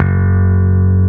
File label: bass